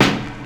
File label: warm snare sound a sharp key